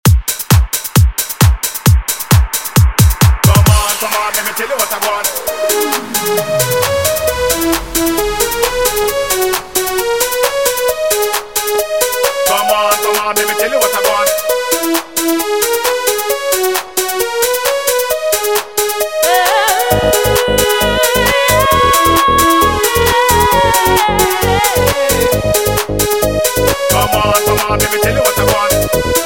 intro 133bpm

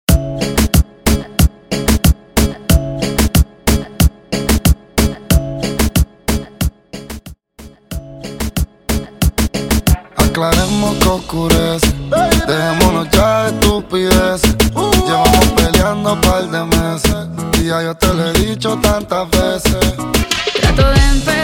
Latin Pop Intro Outro 92BPM

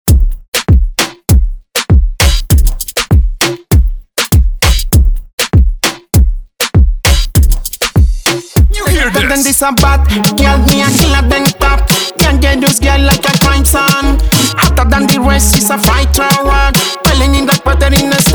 Remix bpm 99, tech house

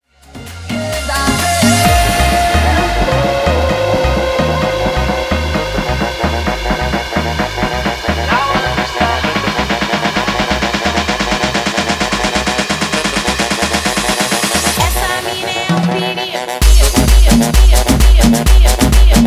guaracha remix 130 bpm

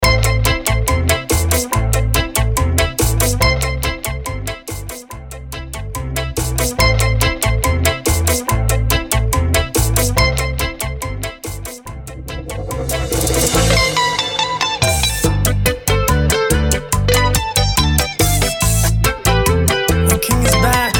Intro Outro Break 142BPM

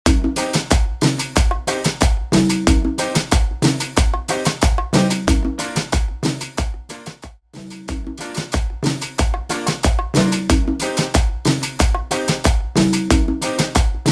Percapella Intro Outro 92 BPM

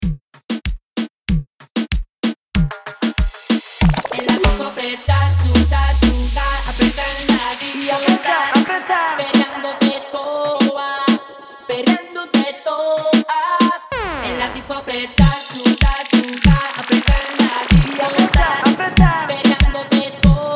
Intro Outro 95 BPM

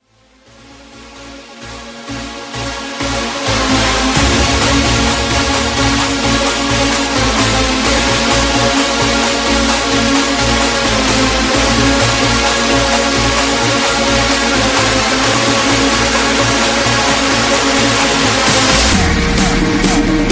Big Room Mashup

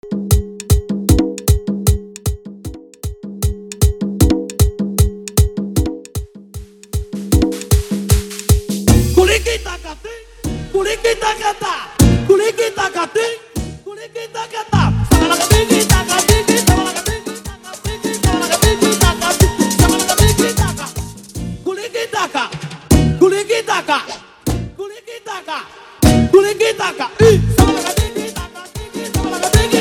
intro break 154bpm

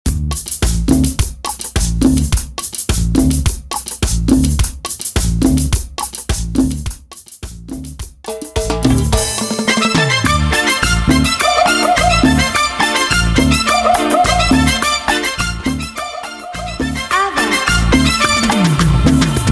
Intro Outro 105 BPM

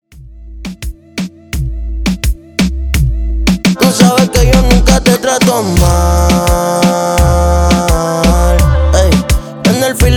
Susu Intro Verso 85 Bpm

